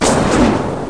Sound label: rpg fire; duke nukem total meltdown